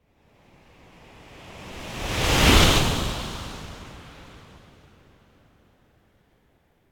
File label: Wave By Strong, Fast And Deep Wave - Passing L to R Wave Whoosh; Hollywood Edge; sound designer's toolkit v2